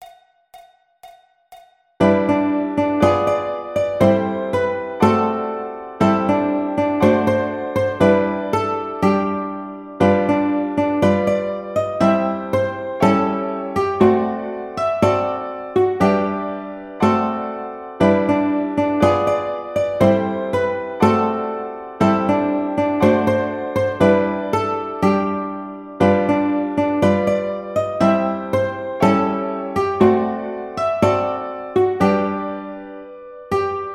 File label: Ukulele